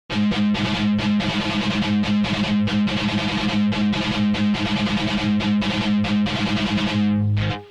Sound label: thrash mix